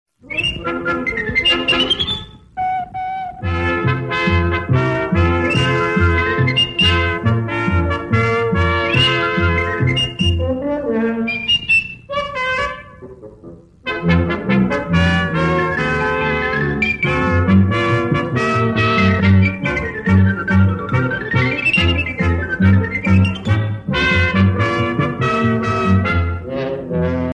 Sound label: message ringtone; popeyee